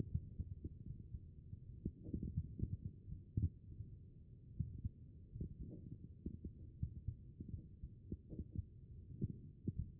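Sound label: HEART, heart sound; B MODE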